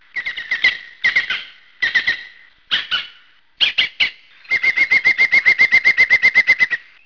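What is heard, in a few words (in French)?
pic vert, chants d'oiseaux; sons nature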